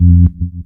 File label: ORG BAS; E-Lab; X Static Goldmine 2; akai